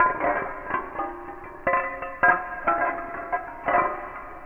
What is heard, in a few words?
Abstract Glass Loop